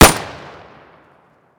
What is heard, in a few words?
shoot; svencoop; arctic incident; ins2